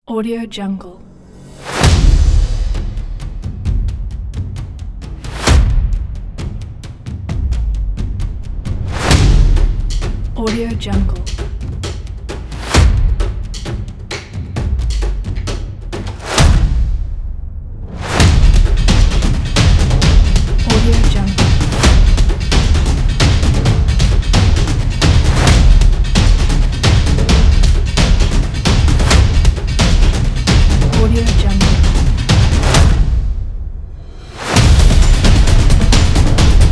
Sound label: epic drums; mrclaps